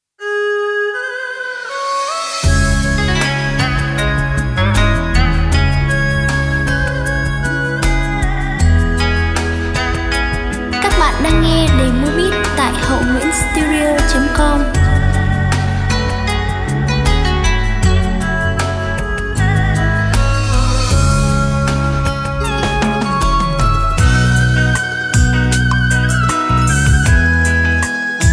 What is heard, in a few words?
beat